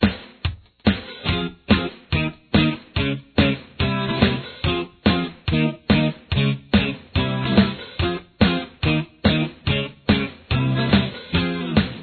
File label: chorus guitar